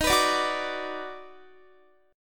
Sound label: Eb Minor Major 7th Sharp 5th Eb down Guitar, chord; EbmM7#5; strummed